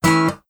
Rhythm Whoops; super crazy rhythm castle

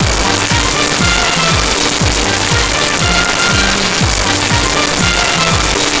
discoloop; sfx endofmap

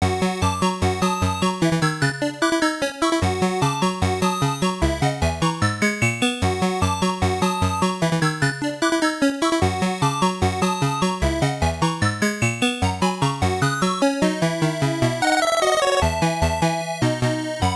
Cave; Wonder Boy in Monster Land Arcade

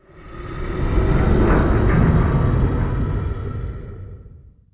Ambient

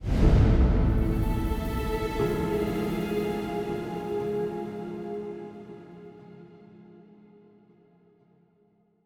Stingers sting risk

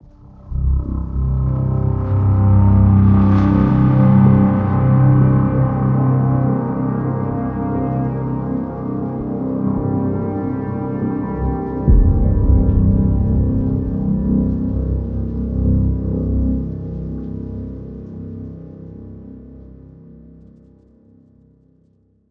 Opening Thunder; Eternal Castle

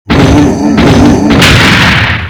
Door Break; ps3; gamerip; House of the Dead 4

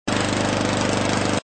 Lawn Mower; Sesame Street Seasons

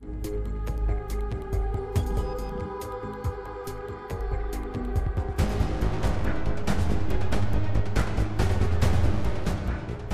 Preview Stinger; apex legends season 12; Wraith

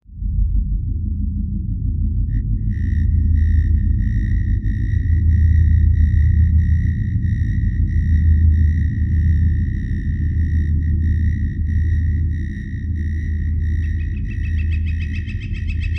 Ambient Night Hunt; beltion beyond ritual

